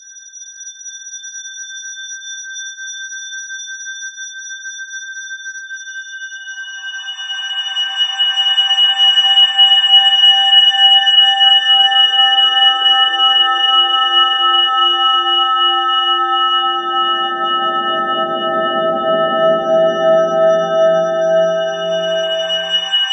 SURPRIS, Ominous Drones; USB Soundscan vol.48; AKAI

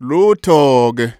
Vocal Chirp, African Voices

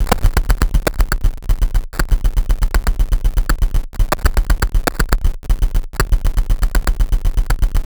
Structured Rhythm, IDM Rhythms

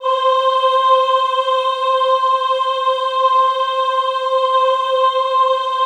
AHH C4, Classical Choir; Best Service; Extended Classical Choir; AHH MIX

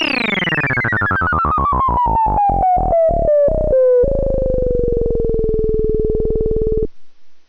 Synth FX

